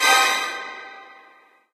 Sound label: Stab; Friday the 13th Killer Puzzle; Android